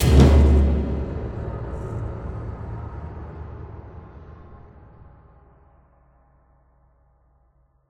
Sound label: combat start; biomutant